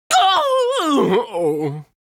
boss hit; Darkcloud2; king mardan

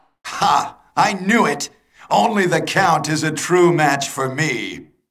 Game Over; SOTN; Richter Battle

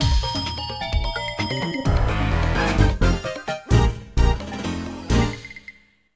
unused stage clear; Hotel Mario

